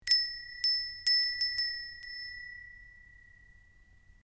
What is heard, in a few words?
wind bell; jidaigeki